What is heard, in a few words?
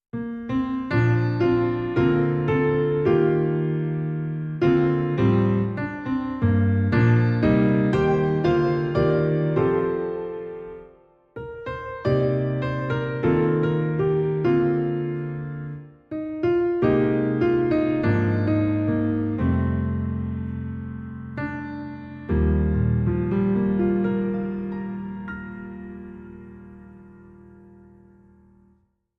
Solo Piano